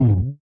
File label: bump; fast madness